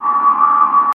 Gates Door Up, spaceship fx

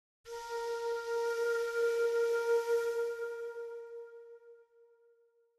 Flute Tone Even

